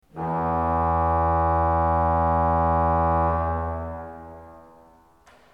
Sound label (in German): ton, orgel